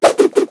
throw; blobAndConquer; combat

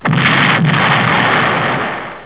kaboom; flyhard

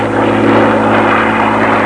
Spitfire MK IX, aircrafts; flightgear

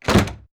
chest close; allacrost-0.2.1

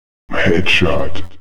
headshot; AssaultCube

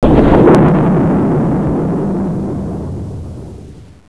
miss; netpanzer

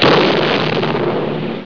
hit; netpanzer

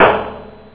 clang; flyhard

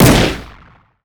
pistol; ufoai

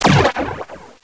bomb ex; xpired

